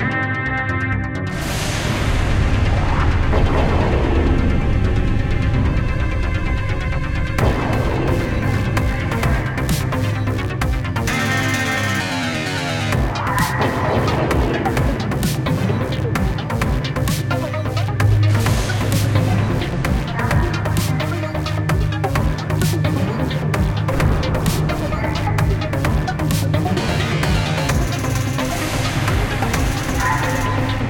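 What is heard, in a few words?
Ship Under Fire Instrumental Ambience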